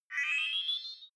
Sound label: game start; signale